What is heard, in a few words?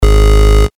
G#, bass shots; Elektro; revealed bass shots vol 3